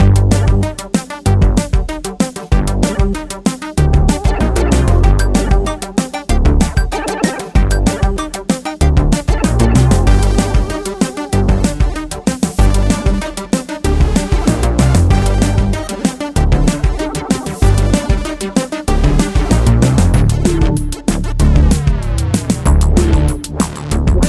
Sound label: Boss fight; sky force reloaded 2016